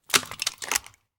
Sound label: Wpn leveractsgreload start; images/9/96